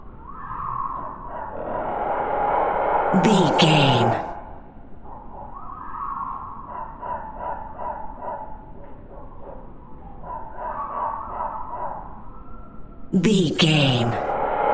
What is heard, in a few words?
fantasy jungle forrest loop